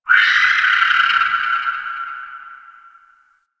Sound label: Night Distant Creature Small; Ambience; One Offs; Warframe; Eidolon Plains